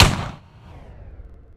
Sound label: m1 garand; WAR